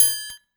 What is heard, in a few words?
Perc Triangle; Arseniiv